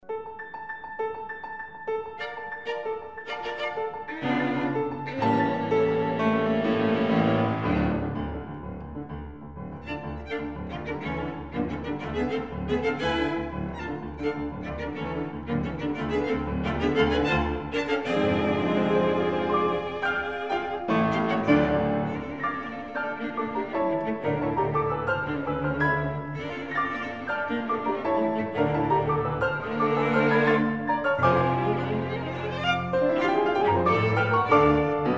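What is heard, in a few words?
piano quartet; bridge; phantasy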